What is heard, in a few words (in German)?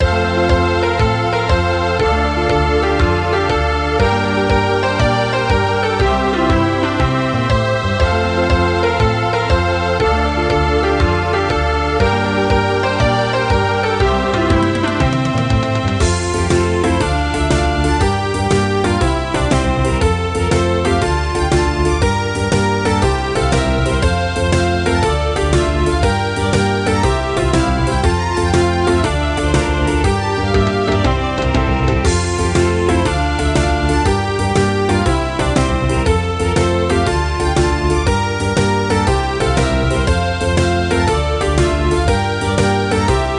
zirkuslieder playback